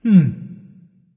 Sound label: tongue; smite